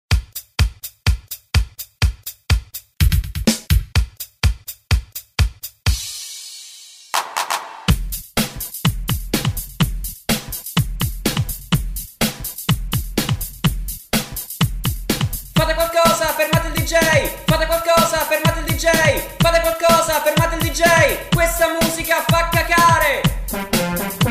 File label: Punk Rock Disco Music